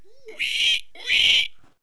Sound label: pig attack; pigs